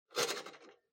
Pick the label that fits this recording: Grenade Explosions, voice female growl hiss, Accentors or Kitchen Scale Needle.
Kitchen Scale Needle